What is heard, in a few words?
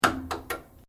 Switch Washing Machine Turn Double Click; Various switches; Appliances; Household